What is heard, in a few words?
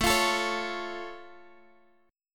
Db Augmented, A